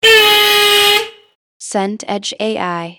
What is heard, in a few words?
whistle referee long